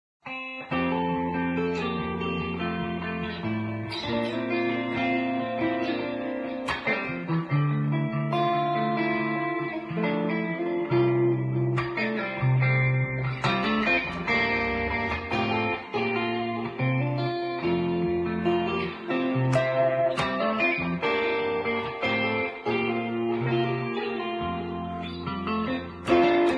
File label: Guitar Electric Merry Christmas; Instruments